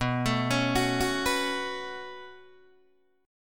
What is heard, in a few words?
B 7th Suspended 2nd, Guitar, Open D